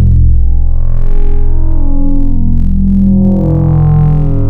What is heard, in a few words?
FM, Wavetables